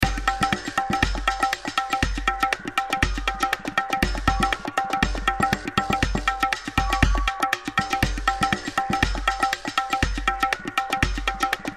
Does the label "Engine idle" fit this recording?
No